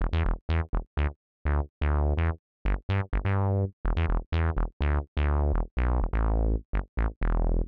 125 res synth bass E; PowerFX - Nu Jazz House

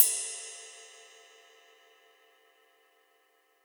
Ride Cymbal

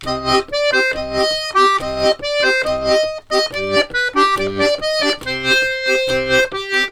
140, Complete Accordions; USB Soundscan vol.40; AKAI

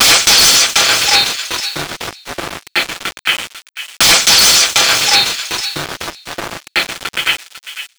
Envelope Beat; Drums & Drones

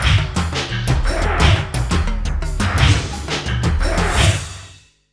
87 VRAC END 3, Industrial Loops

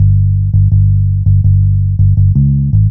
G1 165bpm, Drum & Bass, Bass Loops; Total Drum&Bass

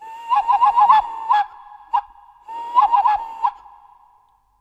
Bird Whistle; African; SFX; Quazibeat; African Secret

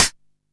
Hat, Drum & Bass

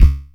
Perc, Korg Wavestation

